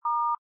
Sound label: DTMF